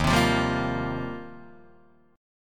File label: Eb 6th Add 9th, Guitar, Open D; 1,3,3,1,1,3